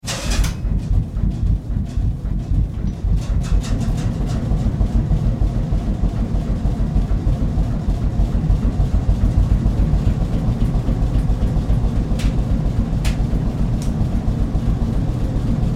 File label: Ship Engine Start and Run; Tom Hutchings